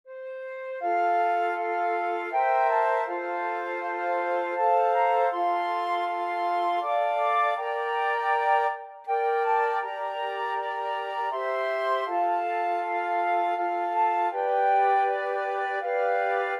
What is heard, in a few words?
flute quartet